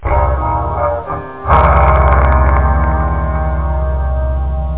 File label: e min 9, guitar; da capo vol1